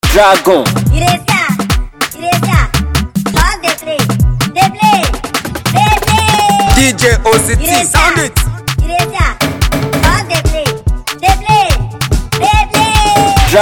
Dey Play Beat

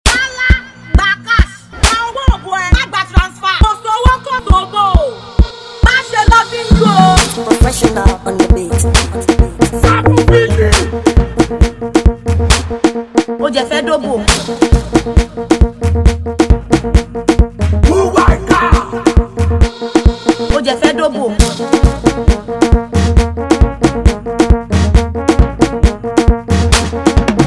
Free Beat

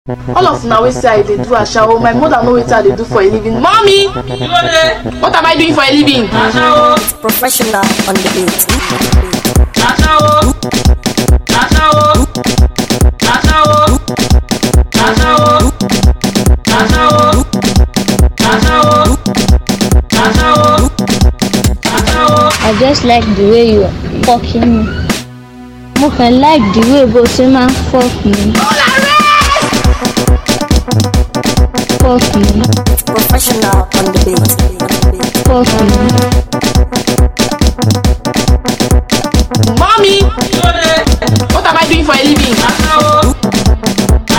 Ashawo Cruise Beat

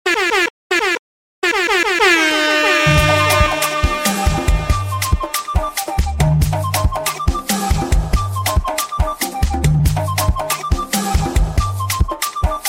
Free Beat

